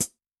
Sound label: Pedal, HiHat; Yamaha DTXpress-II